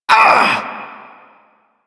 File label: Pain; New War; Ballas